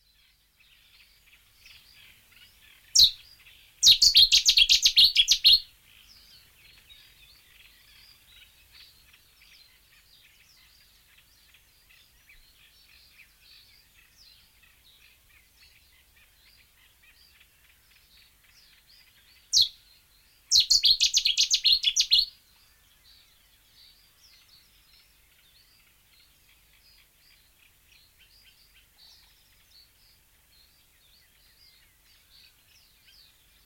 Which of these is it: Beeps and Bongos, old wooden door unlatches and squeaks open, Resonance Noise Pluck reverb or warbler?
warbler